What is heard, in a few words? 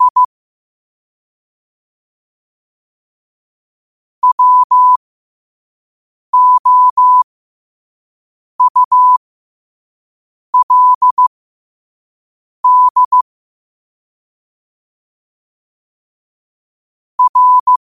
5 wpm, morse